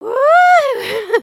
water ride; emotes

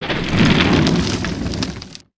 Pillar Crumble

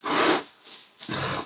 Pets Tortoise Idle; creatures; Lego

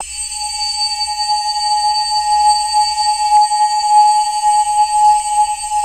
Run Pad; nexus tower

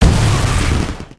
Flare Impact

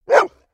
doberman bark

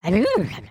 Stunned; Lego Strombie; creatures organic

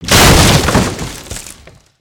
Platform Fall End; ninjago

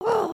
headbang

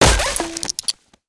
instrument smash; destructibles

